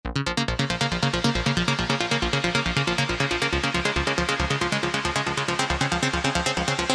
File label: Arps Midi trance